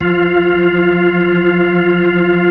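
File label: B-3, Hammond B-3 organ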